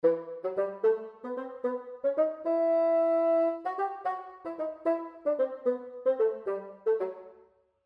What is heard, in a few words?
Bass Clef Example